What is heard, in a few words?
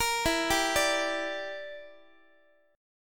E Minor 7th Flat 5th Guitar; x,x,13,14,11,13; 4A,4E,5A,5D,5G#,6C#; arpeggiated; JGuitar